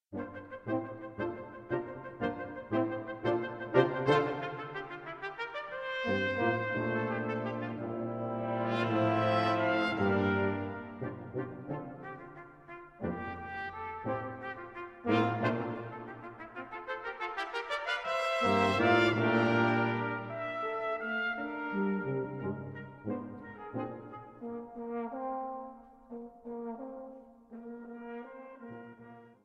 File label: Five Brass; Four Movements for Five Brass; Mvmt IV